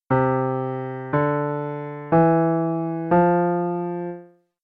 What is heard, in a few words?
Bass up C Maj tetrachord up